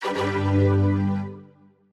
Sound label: royal flush, Video Poker; Casualino